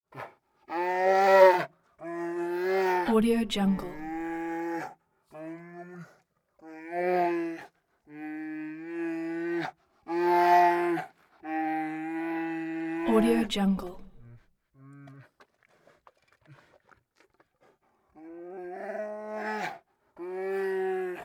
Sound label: bear vocals huffing moaning roar; prosoundfx